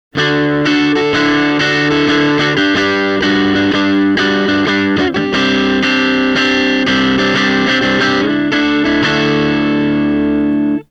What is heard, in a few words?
hi clean